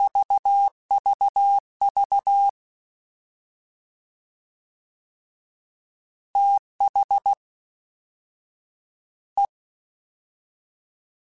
Fast TH, CW Code Groups; Mixed; Prefixes

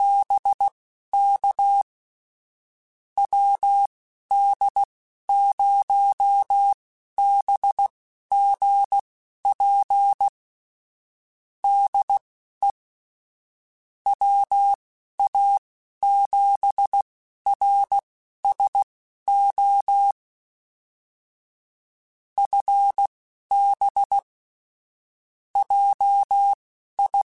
Morse Code, QSOs

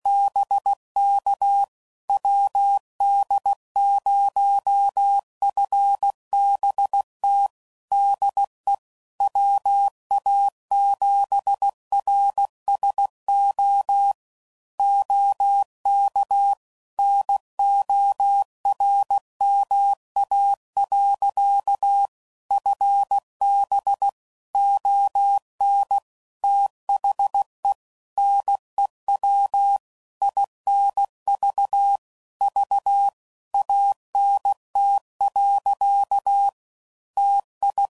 Spaced, CW Code Groups 15WPM, QSOs; HamRadio; Morse Code; WD0FBT

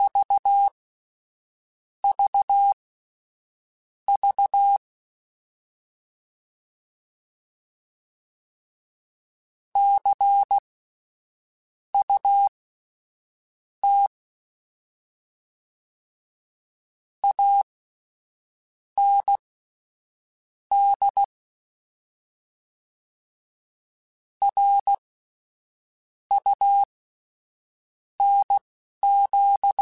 Word Pairs, Morse Code; HamRadio

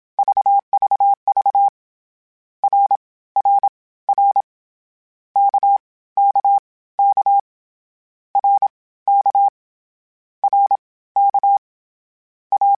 CW Code Groups Mixed Short Runs Balanced; Morse Code; HamRadio

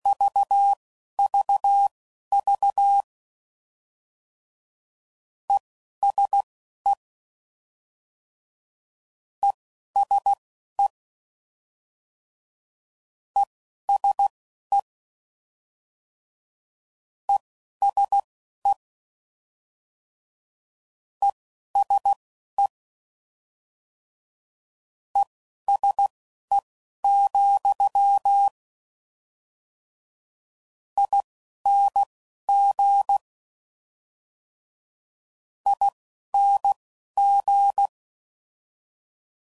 CW Code Groups 10WPM, Suffixes; HamRadio; Morse Code; Mixed

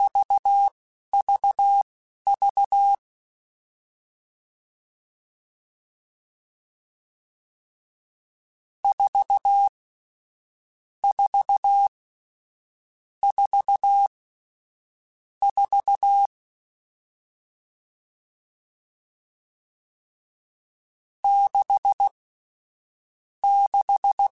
CW Code Groups Mixed Short Runs Staccato; HamRadio

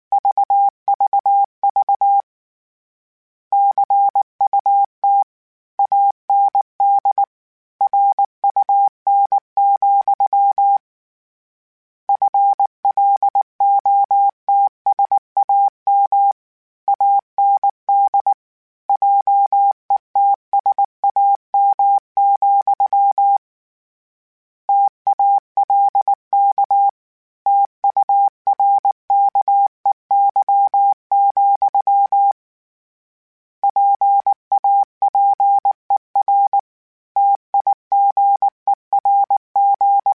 Word Pairs, Morse Code; Mixed; HamRadio

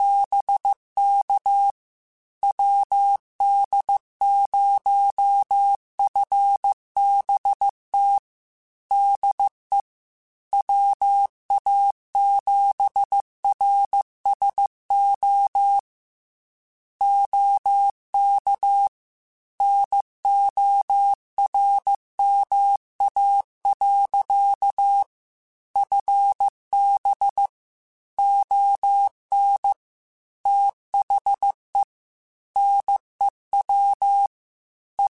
Morse Code, QSOs

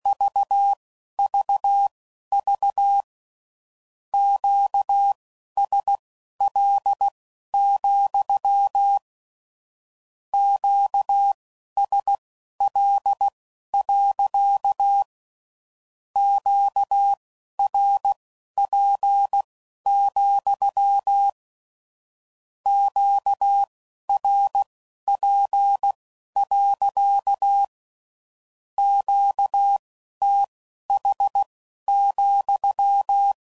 Q Calls, CW Code Groups 15WPM; HamRadio; Morse Code

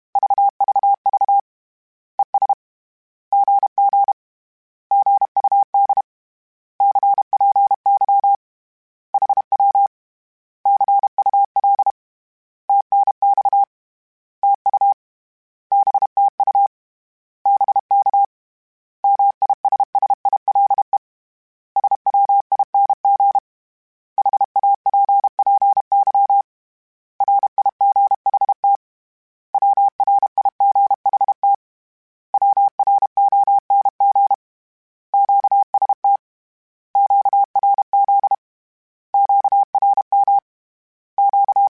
Words, Morse Code; Mixed; HamRadio

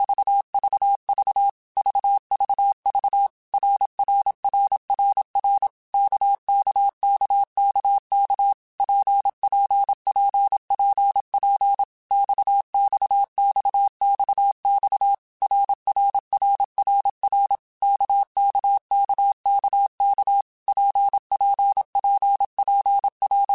RKPX, Morse Code; HamRadio; Basic Sets

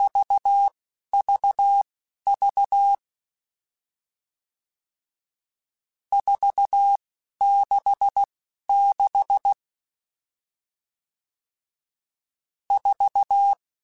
Various RST 10, Morse Code; CW Code Groups; RSTs; HamRadio

